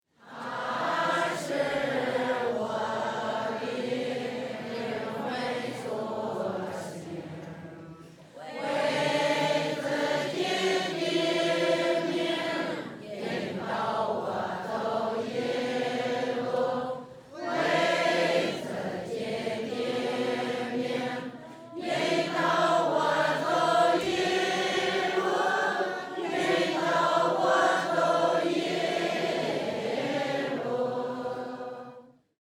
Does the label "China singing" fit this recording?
Yes